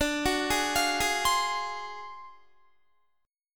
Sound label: D 7th Sharp 9th Flat 5th, Guitar; 4F,4A,5C,5G,5C,6E; arpeggiated; JGuitar